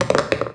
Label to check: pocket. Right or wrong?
Right